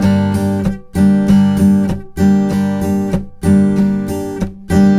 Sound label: riff 96, Blues Guitar; ILIO; Fretworks